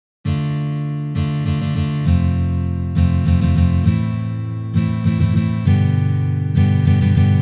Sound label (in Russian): Valsovyj boj na gitare